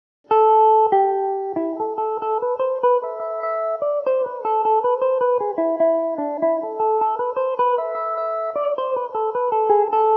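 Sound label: A minor mandobird, irish mandolin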